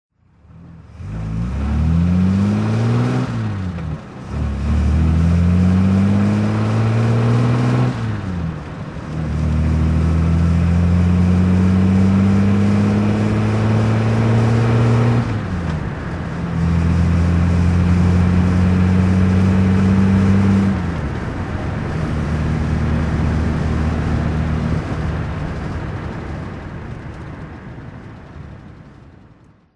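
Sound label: jaguar xk120ots 5speed driving, Engine sound driving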